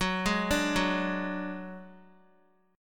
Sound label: Gb Suspended 2nd Sharp 5th, Guitar; arpeggiated; JGuitar